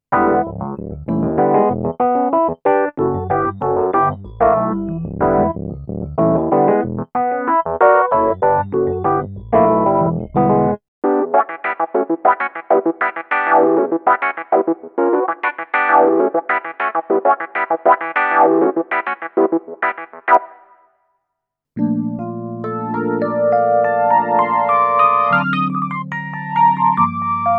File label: kb rhodes; kurzweil pc361